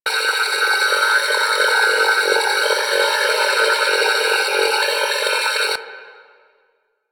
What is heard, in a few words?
Prism Laser Loop; Warframe; Warframes; Harlequin